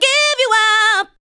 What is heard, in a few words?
ADLIBS